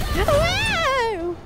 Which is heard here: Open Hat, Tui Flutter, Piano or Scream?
Scream